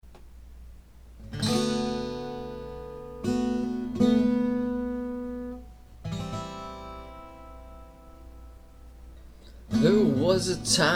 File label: Prelude